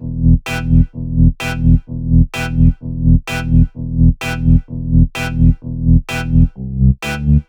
Melody 128 BPM Root E

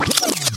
Vinyl FX and Scratches, FX Scratch

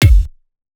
Kick; Dubstep Sample Pack; BassDrums

